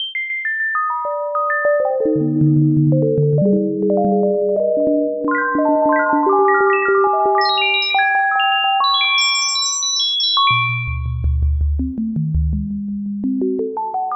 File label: bell synth; Max